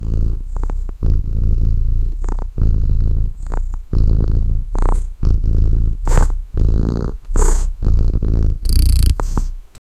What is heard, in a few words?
animal cat wild puma purr; wildlife